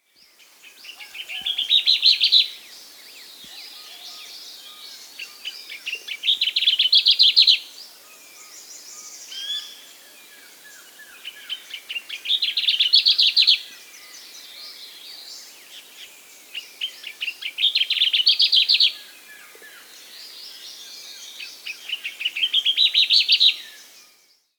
Two-Banded Warbler (Basileuterus Bivattatus), Andean Birds; S. America